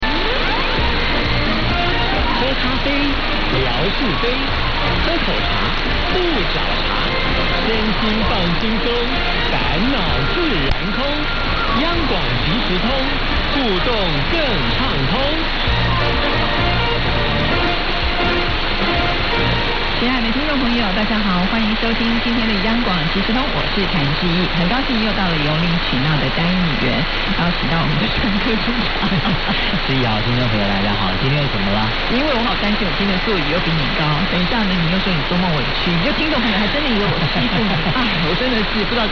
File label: 1557 khz